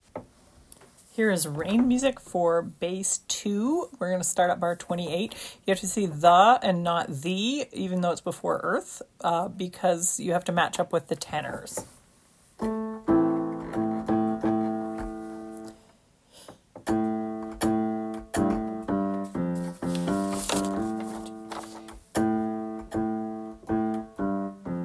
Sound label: rain music bass